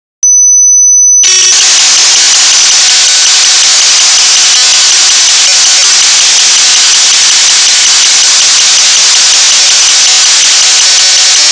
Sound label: Bassline